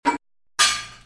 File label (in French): Bruitage sur etabli; Outillages